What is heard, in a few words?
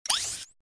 drone scan start; secretagents